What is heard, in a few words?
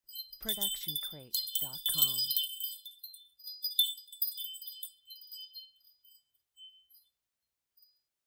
Wind Chimes C